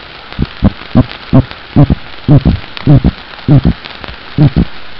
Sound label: Epinephelus striatus Agonistic